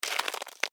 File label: freeze end; ThreeHeadedDragon